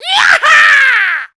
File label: "yiepah", shouts; Best Service; Hyper Dance